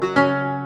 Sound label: lyre; code game jam drop plafond 2025; les sons pour la lyre